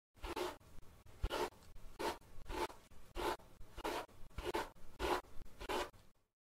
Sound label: combing hair; شانه زدن مو